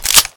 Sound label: close; python